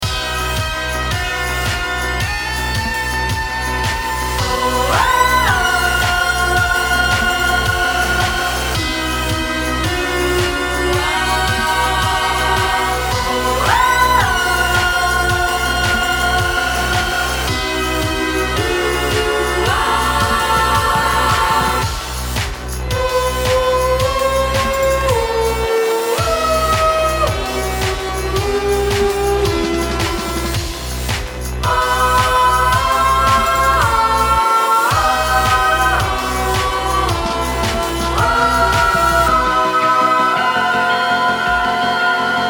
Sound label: VIDEO GAME MUSIC